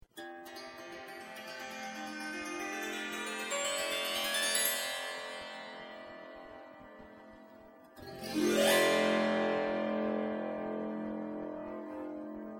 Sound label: Open Harp